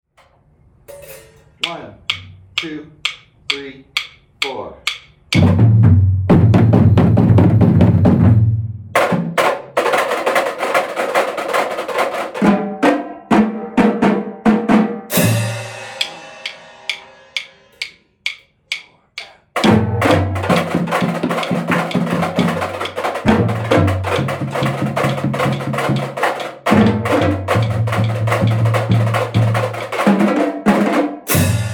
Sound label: Drumline; Hawks